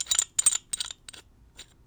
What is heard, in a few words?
Pipe Wrench; bb - Smol Mechanisms